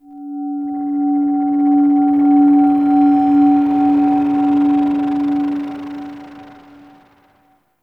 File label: Temple Mid Bells Swell, Corpus Ship ambience; Temple One